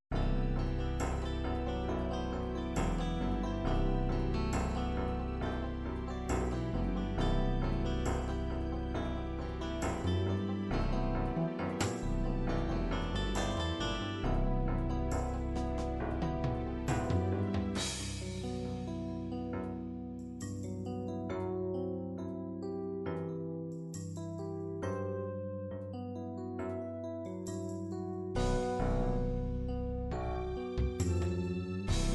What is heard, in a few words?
ballads ballad pop ballad, midi